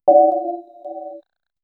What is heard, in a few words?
smooth futuristic chill v, neon glow